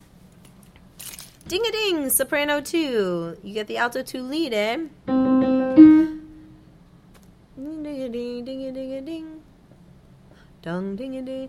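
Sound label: Ding Soprano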